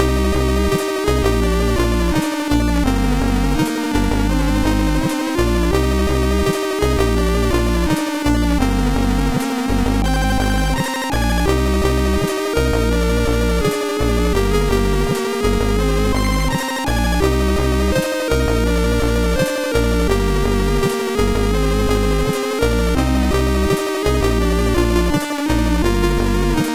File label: Thunder Intro, MOS6581R4